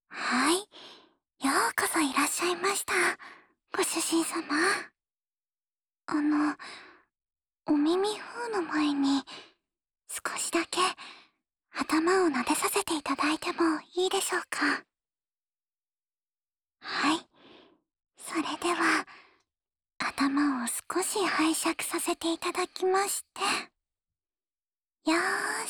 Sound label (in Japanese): 優しい吐息いっぱいお耳ふーふー(右), ASMR